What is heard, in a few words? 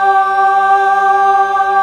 TRON FEMAL; E-MU Formula 4000 Series Vol. 3; Analog Odyssey; VK Instruments